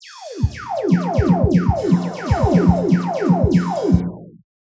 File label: drum mix